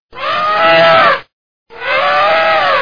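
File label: elephant loxodonta africana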